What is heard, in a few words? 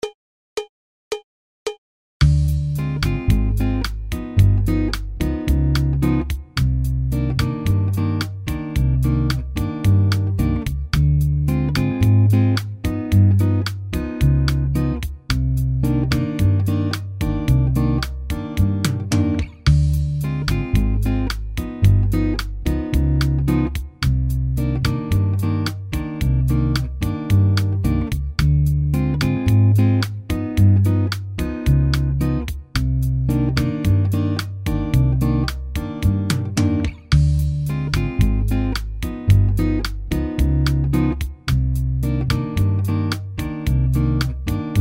bossa; 50licks